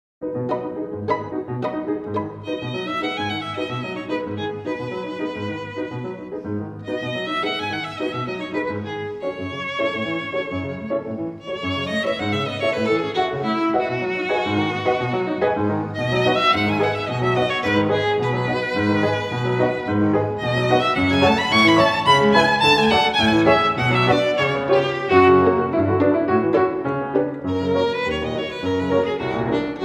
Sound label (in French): Deux Morceaux Pour Violon et Piano - II. Cortege